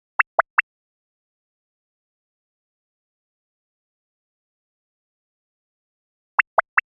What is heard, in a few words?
Perc